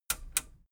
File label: metal button radio click enable slow